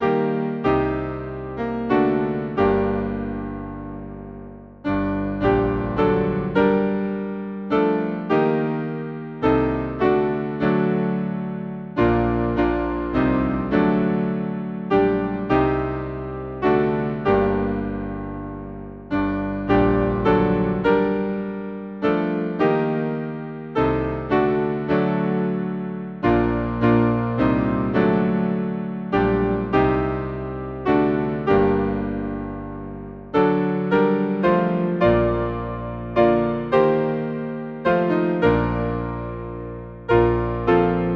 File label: Hymn, piano, Wer Nur Den Lieben Gott; If thou but trust in God